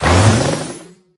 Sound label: barrel roll ulti; Brawl Stars